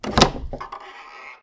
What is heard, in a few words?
door Open; Kenney's Sound Pack; RPG Audio